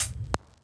drumbreak hihat

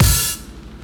drumbreak kick

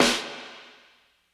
80s reverb snare

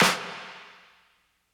80s reverb snare

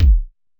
kick; Electro; alternative

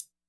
disco hihat; Electro

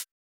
hihat; Electro; alternative

